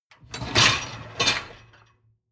dishwasher lower tray draw out short; maschinen; spuelmaschine

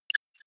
click digital; maschinen; signale; soundpool

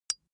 metal impact tiny solid ring; hits explosionen; metall

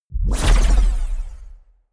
sci-fi plasma gun fire digital; pistolen gewehre

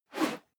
whoosh whistle

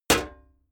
sheet clank damped; hits impacts

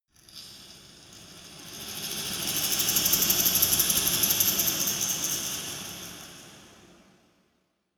dark resonator rattle dynamic; maschinen

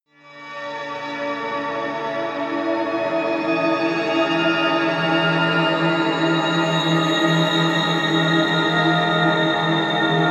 drone rarely harmonic

